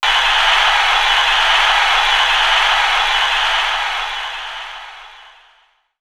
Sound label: atmo, FX; Ueberschall; Techno Trance Essentials